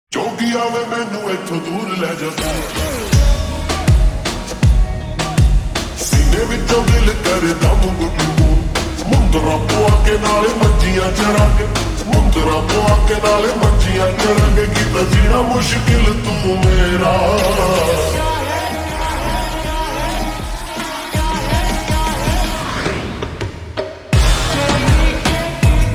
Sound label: Ringtone slowed reverb